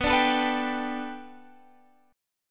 B Minor 7th Sharp 5th B Guitar Half Step Down, chord